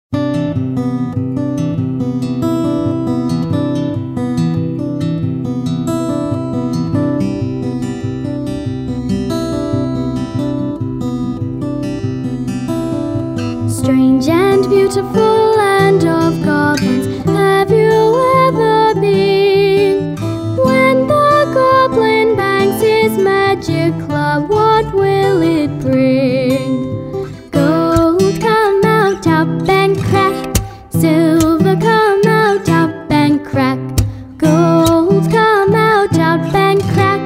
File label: Land of Goblins (English)